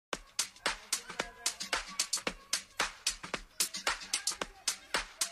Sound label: Funk d Up